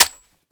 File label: mp5 bolt slap; Rust